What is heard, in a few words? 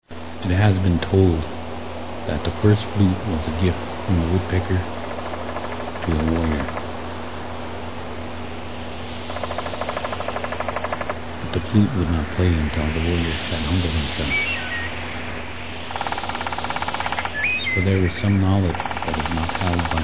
first flute